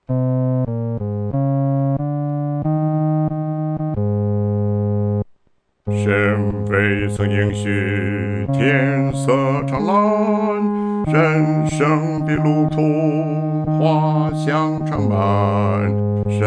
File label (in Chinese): 独唱 第四声